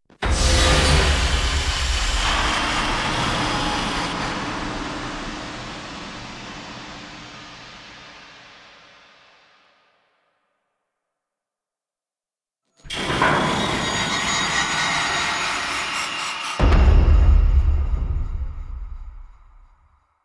Queens Platform Raise; Warframe; The War Within

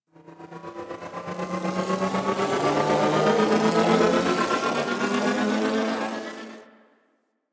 Cinematic Machine; Warframe; Ambience; Hub; Tenno Junction Relay

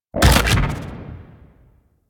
Shotgun Alt Shot; Weapons; Revenant; Warframe; Tenno

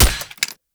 Shot Initial Mech; Warframe; Weapons; Acceltra Prime

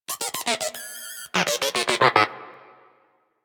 Moa Inquisitive Vocal Kata; Warframe

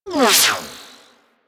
laser whizzby; Warframe; Teralyst; Vomvalyst

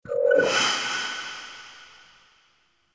Callstone Transmission In; UI; Transmission In Outs

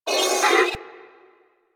Sentient Pain, Barks; Enemies; Symbilyst

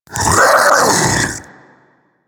Infested Splitter Vocal Pain; Warframe; Grey Strain

